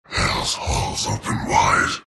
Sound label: shards death; terrorblade